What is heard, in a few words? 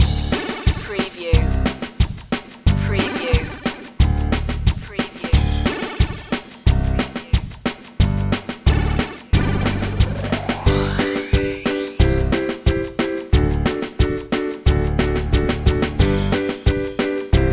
retro video game bubbles loop